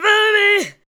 ADLIBS

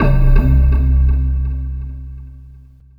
Echo Perc; Sonic Foundry (Sony Creative Software) - Futurist Drum & Bass; Drums & Percussion

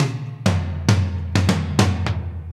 120 fills; Sound & Vision - Gigapack I

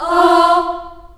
F3 child AH, choir; CHILD AHS

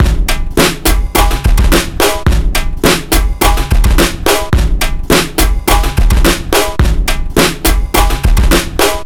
drm 106, Breakbeat, 106bpm; ProSamples vol.40 - Breakbeat 2; Best Service; Magix Tea

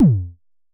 Unce Unce Kick F2, Vermona Kick Lancet; LookIMadeAThing Kicks

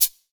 SR CABASA, Drum Mashines, SR-16 DRUMS; EdgeSounds

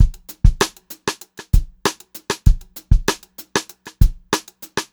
Funk Groove Drumloops, funky; USB Soundscan vol.38; AKAI; 90 s Sample CDs

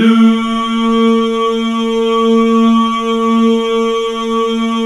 DOO B 2, Synclavier Strings; ILIO

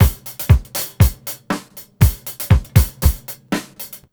EASY BEAT, Roots Disco; USB Soundscan vol.32; AKAI

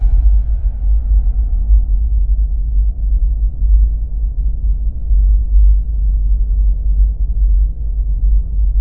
LOW RUMBLE, MACHINE THROB; Zero-G Ambient 1

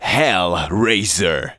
vox; Ueberschall; Techno Trance Essentials

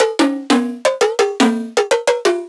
707 mod Cowbell

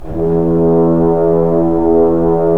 BRS Tbns; Roland - LA Composer vol. 4

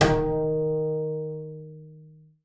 BING D#3, BASS FINGER; Xsample VOL-5

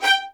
LDVLN1 ATT G, LOUD VIOLINS; Q Up Arts; Denny Jaeger Master Violin Library

